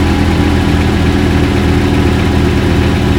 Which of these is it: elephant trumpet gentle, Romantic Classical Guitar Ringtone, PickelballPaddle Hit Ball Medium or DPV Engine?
DPV Engine